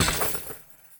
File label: Street Ball Glass Basket Chain; SFX Kit